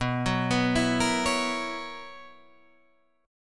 B Major 7th Suspended 2nd Suspended 4th B Guitar Open G